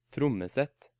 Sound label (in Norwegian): Trommesett